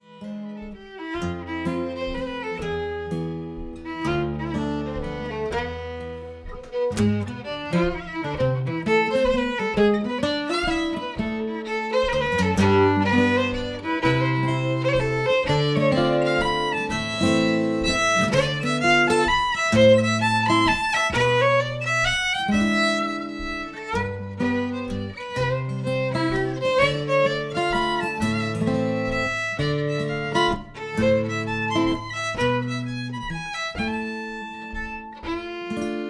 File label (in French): Mazurka; tradethik productions; marchand de sable